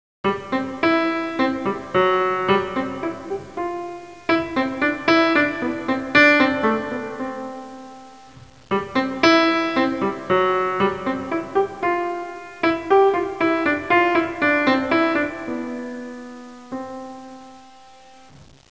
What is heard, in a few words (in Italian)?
suonerie classica; musica classical